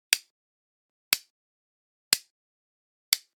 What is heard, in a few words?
Generic Click Plastic Small